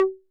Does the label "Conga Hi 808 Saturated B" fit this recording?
Yes